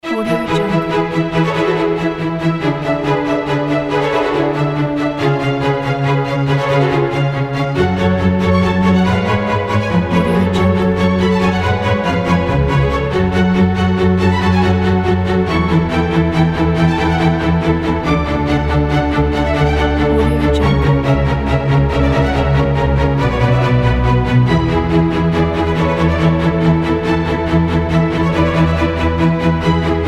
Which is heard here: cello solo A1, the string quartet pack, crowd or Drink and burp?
the string quartet pack